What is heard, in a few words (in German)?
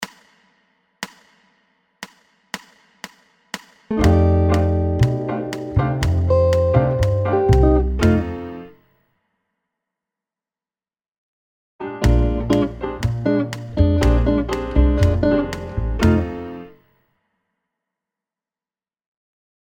Pulsuebung Latin 120bpm